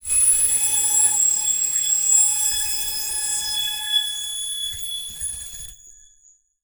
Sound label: Transition Digital Scanner; Adobe; Production Element